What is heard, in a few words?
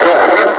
pain, suppressor